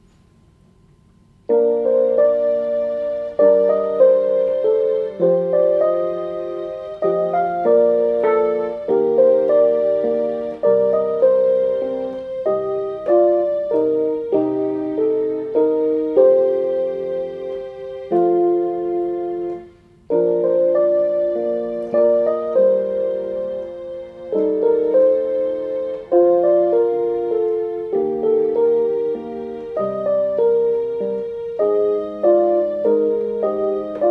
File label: Kyrie piano; Chor; Kyrie 5; Katholische Gemeinde Salvator in Lichtenrade